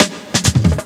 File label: Loops Fills; Vengeance Samples